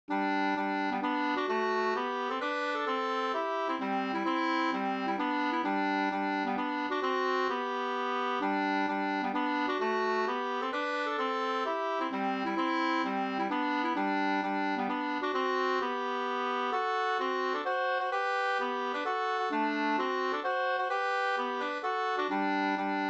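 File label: polska, two-part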